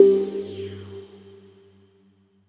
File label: Cmin - collision; Color Zen Kids; 3DS, Android, iOS, Mobile, Switch, Wii U